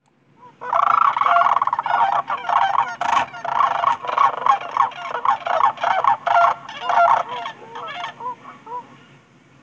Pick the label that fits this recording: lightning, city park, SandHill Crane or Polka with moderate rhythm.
SandHill Crane